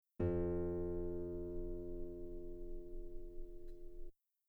guitar F2 very long piano normal; bananenkeyboard

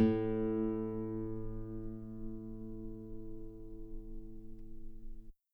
guitar A4 very long forte normal; bananenkeyboard

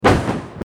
car To Car Crash; teststrecke game

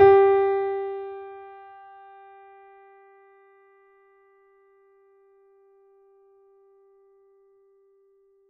mini grand; bananenkeyboard

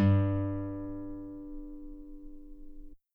guitar G2 very long forte normal; bananenkeyboard

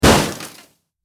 Car Crash; teststrecke game

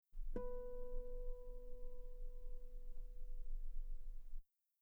guitar B4 very long pianissimo harmonics; bananenkeyboard

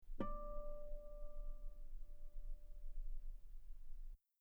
guitar D5 very long piano harmonics; bananenkeyboard

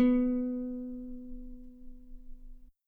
guitar B3 very long forte normal; bananenkeyboard

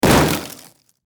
Car Crash; teststrecke game

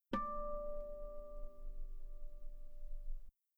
guitar E5 very long piano harmonics; bananenkeyboard

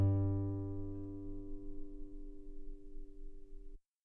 guitar G2 very long piano normal; bananenkeyboard

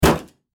Car Crash; teststrecke game

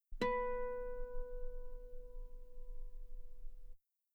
guitar B5 very long forte harmonics; bananenkeyboard

